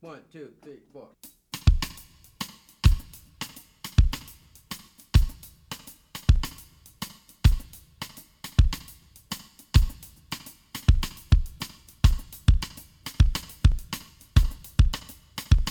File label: cascara with snare bass